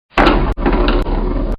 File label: roll; Collection Synesthésie; Isabelle Grosse